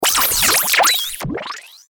Stinger Frequency Warble, Stinger Frequency Sweep Digital Warble; Production Element Imaging Element Accent Transition